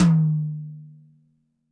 TD-20 Tom B, Roland TD-20 Toms

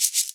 shek, WORLD PERCUSSION; PS MIXED PERCUSSION; WORLD INSTR 2

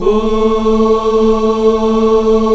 afro ooo, choir oos; Spectrasonics; Heart of Africa 1

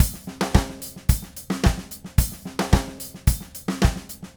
Red Maple Groove 110, DW Red Maple Kit

